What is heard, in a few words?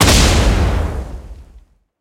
lines boom; Burning Reels; wazdan